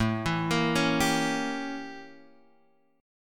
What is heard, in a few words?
E Diminished 7th A; Edim7/A Chord {X 0 2 3 2 3} arpeggiated